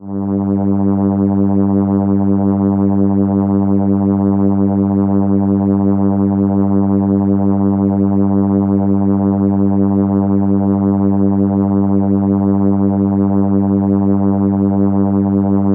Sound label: Cello G3, ARP Solina